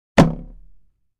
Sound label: Arrow Impact Wood; arrows